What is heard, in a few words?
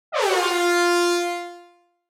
Reggae air horn F3